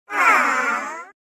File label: aww